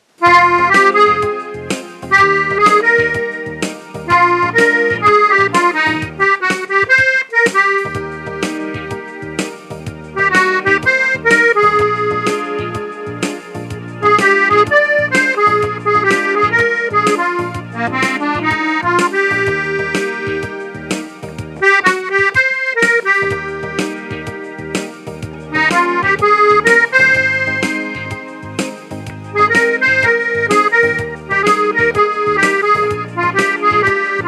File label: Madison Melody